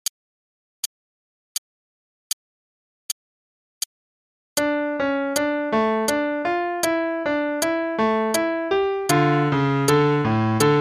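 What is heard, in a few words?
Play-a-long, Prelude in D Minor, eighth note=82; Bach; BWV 935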